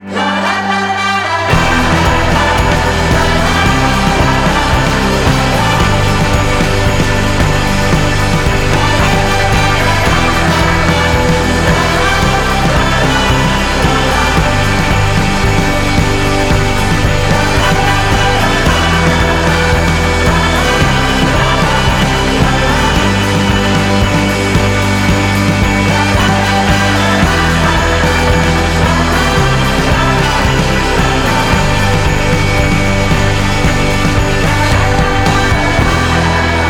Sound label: Ringtone